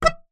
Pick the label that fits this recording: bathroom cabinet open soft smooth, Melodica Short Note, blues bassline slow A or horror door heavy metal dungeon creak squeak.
Melodica Short Note